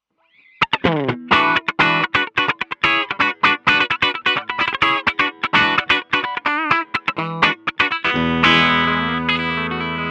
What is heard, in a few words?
Little59 clean